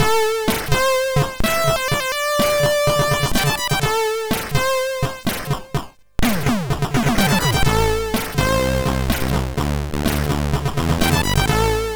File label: Jingles sid MOS6581R3